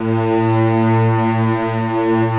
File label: ARCO C, STRINGS; Roland - LA Composer vol. 1